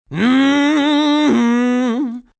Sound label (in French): chant; MOBICLIC 83; CPLUSCLAIR